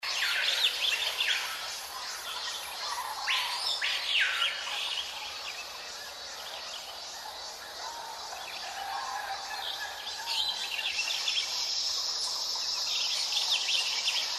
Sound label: jungle; level 2